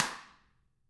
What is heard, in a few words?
Drums, SNR; CAMCO